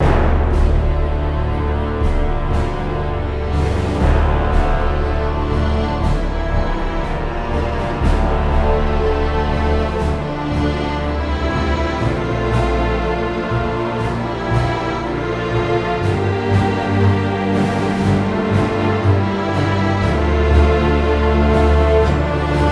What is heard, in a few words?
Grand Flight (With Wind SFX); Ace Combat X2 Joint Assault; PSP